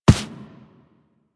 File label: Run Default, Footsteps; Warframe; Operator